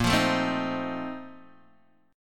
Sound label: Bb Major 7th Suspended 2nd Suspended 4th, Guitar, DADGAD; x,1,3,2,3,1